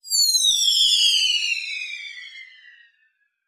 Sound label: Sharp Piercing Squeal Sweep